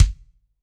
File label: AM KICK, AMBIENCE KICK